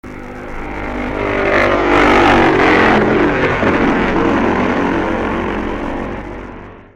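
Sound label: Seeley Matchless, motorcycle; 1966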